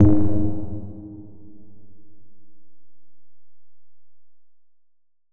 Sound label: short med, High Impact